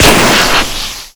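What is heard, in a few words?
vehicle tire explosion blowout; Explosions; Call of Duty Modern Warfare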